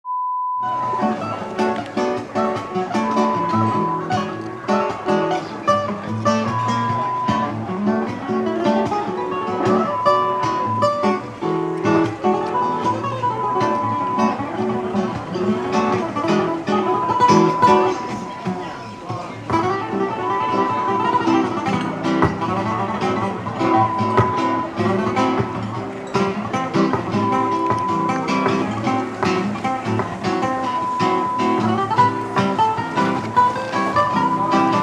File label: Garden Party sound